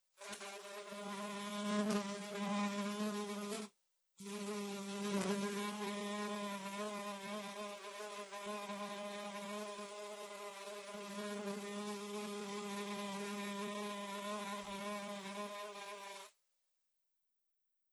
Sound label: Insects; SFX; Modelowanie Wirtualnych Swiatow; Hut; Props